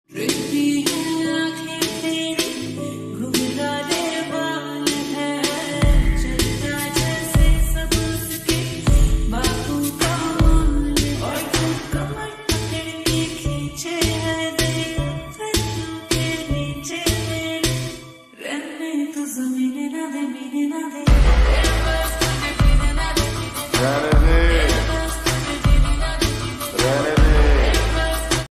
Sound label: lofi remix hindi rap ringtone